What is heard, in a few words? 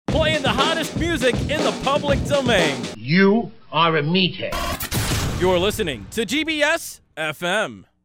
jingle